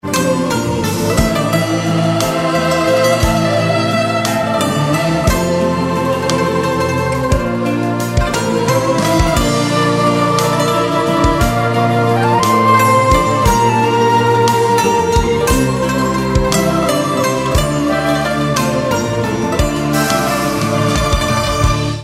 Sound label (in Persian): Ringtone Bikalam; Sad